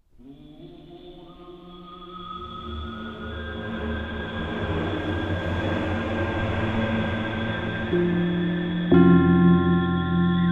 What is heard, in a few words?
Desert; Untold Legends The Warrior's Code; PSP